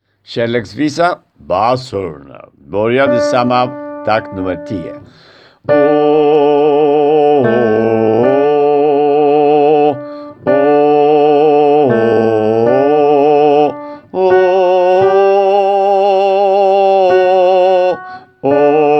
bas

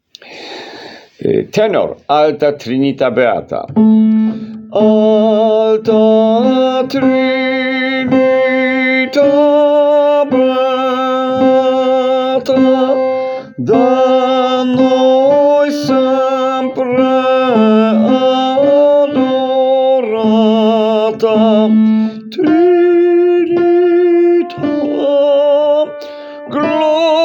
tenor; Alta Trinita beata tenor

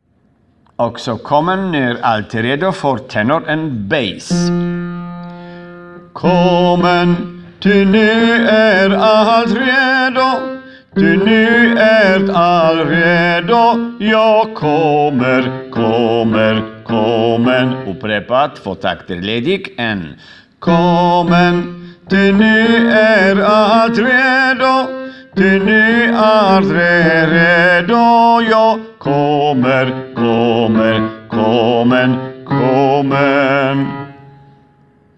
tenor bas

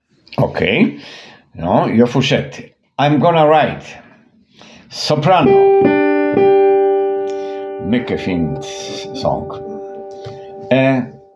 sopran

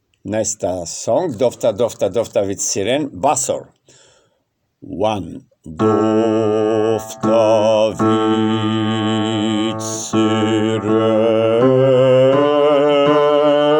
bas

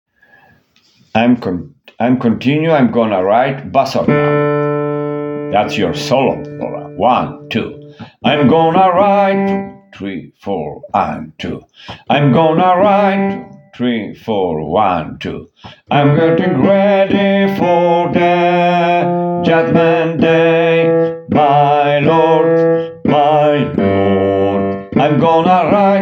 bas

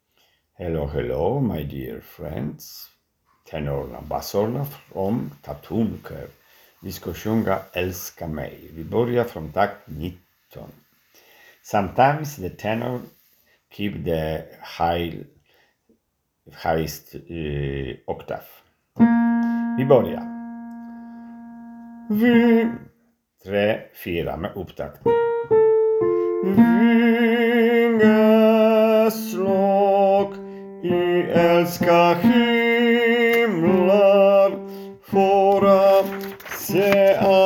tenor o bas

